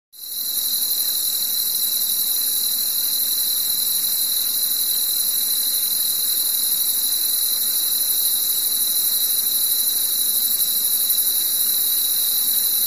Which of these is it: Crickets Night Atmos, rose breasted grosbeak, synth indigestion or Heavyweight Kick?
Crickets Night Atmos